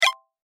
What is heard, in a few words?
Camera Click